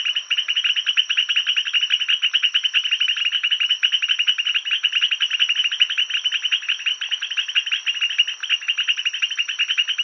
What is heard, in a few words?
Leptodactylus albilabris chorus; Culebra